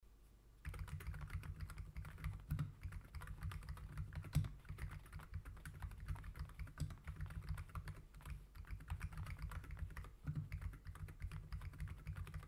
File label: epomaker nude rosa